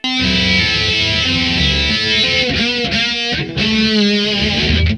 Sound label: GRUNGE, Distortion Gtr; PlatinumPhattCD2; E-MU Producer Series Vol. 8 – Platinum Phatt